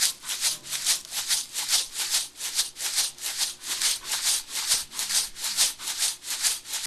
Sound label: Shakers 140BPM, loops